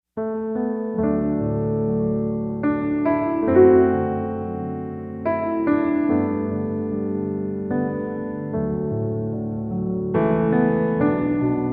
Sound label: Amazing Grace, piano